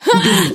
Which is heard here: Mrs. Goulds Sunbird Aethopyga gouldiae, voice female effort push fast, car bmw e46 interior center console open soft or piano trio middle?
voice female effort push fast